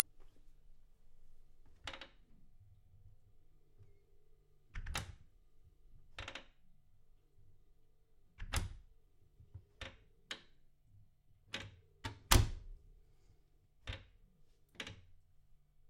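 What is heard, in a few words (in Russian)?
открытие и закрытие двери треск скрип удар при закрытии двери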